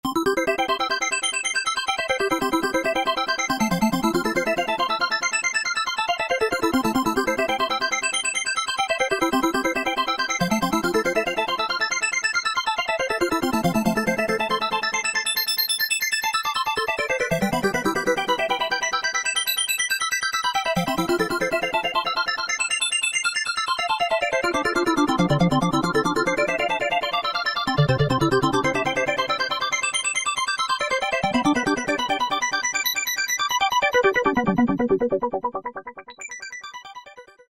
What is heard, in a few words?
Korg microKORG Analog Bell; Factory Voices